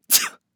Female sneeze short fast

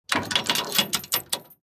Heavy chain padlock rattle metal gate

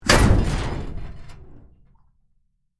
Tank armor hit by enemy fire

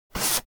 Paint brush painting wood short stroke

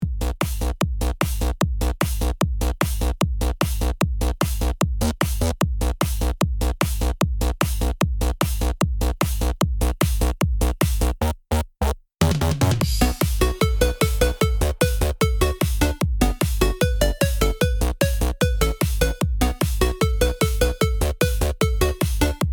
Gaming arcade respawn rush

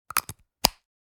Speaker connector adapter plug in; headphones and speakers

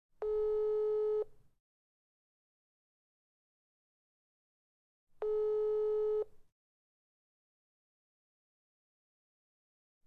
Mobile phone dial tone x3

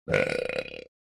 Man burping disgusting long

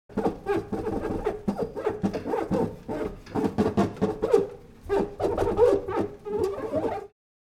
Cleaning fridge appliance household; Household Appliances Sound Effects

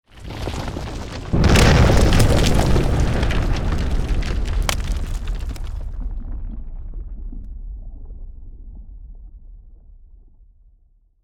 Volcano lava eruption explosion debris; nature

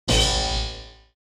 Piano strings hit

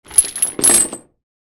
Chain drop metallic impact soft

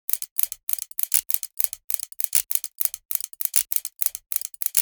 Small unusual clock mechanism loop; steampunk; gfxsounds

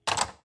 Telephone set down

